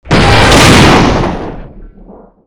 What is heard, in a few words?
crash, damage; DCS